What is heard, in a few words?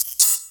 buzz kerchick; sgi